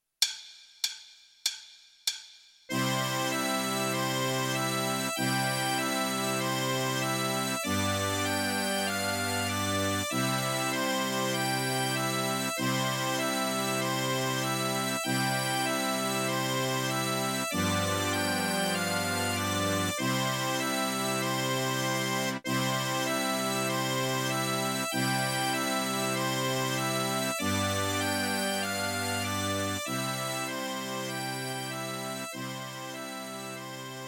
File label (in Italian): Fisa